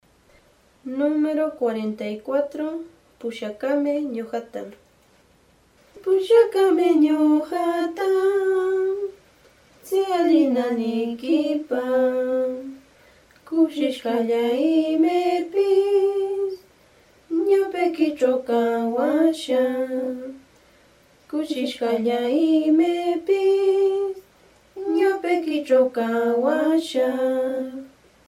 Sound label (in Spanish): cancion